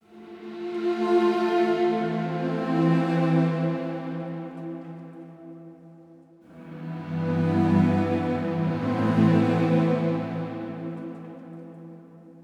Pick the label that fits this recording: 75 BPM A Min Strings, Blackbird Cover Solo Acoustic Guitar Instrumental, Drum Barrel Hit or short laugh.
75 BPM A Min Strings